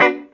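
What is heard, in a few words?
guitar chord B minor, roots reggae; Sounds Good - Roots Reggae; GTR CHD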